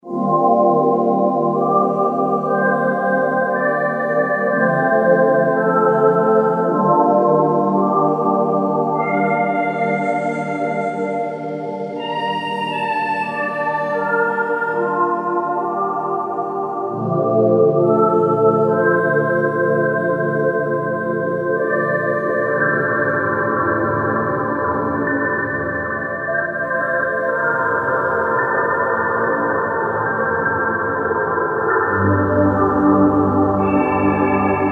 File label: Choral Sleigh; Kurzweil K2000 Orchestral ROM